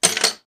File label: tools, wood metal; wood metal SFX